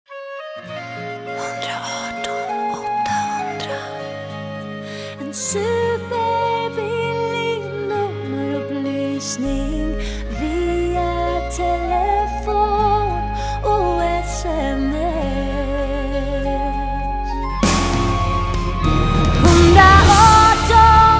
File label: ballad